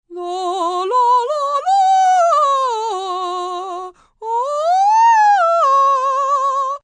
Singing Lalala, Vocal warm-up, singing, female, attempted scale warm-up, funny, comedic; cartoon (human voice); Funny Sound Sound Effects